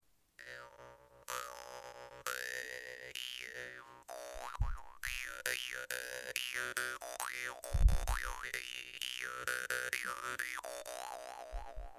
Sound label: danmoi bass